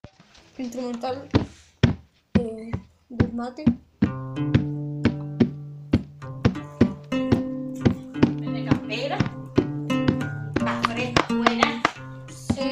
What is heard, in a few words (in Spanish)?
instrumental